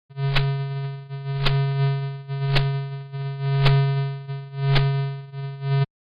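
summed output of 40 fully-connected phase pulling oscillators (sine tone) synchronizing with a period 60 pulse (noise burst)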